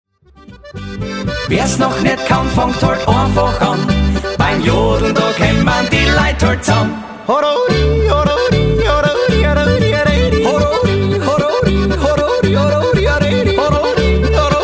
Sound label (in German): Jodler